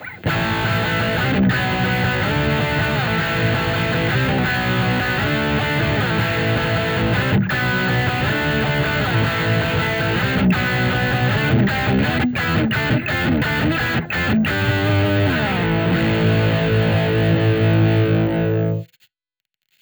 lead bridge gibson; vintage

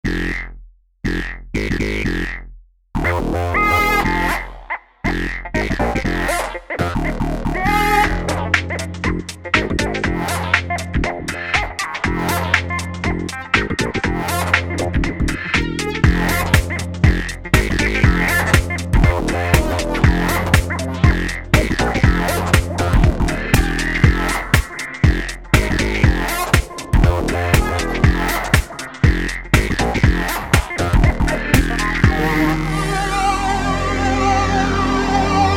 filter c by midi mixed; usb ultrafocus

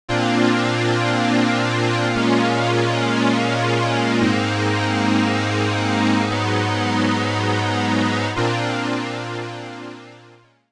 roland rs202; gforce vsm; ce1 uad1

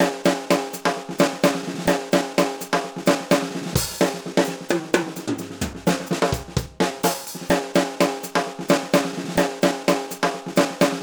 overhead; micros overhead; audioctone km-8p

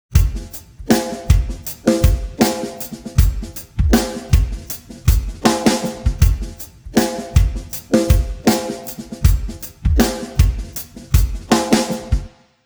drums, timestretch, 133; prosoniq time factory clear scale

